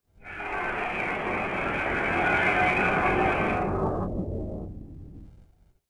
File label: ambient Voices; XDK4627 BIOS and XDK Launcher and Dashboard(for Retail); ogxbox